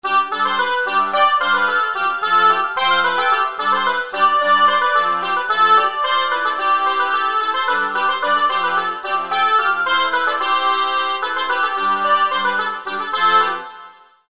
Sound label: oboe